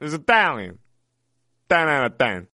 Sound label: italian tune ringtone